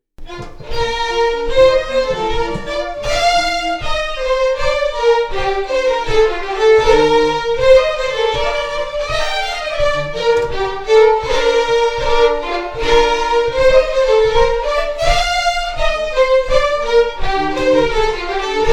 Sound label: Quick Step, Scottish Fiddling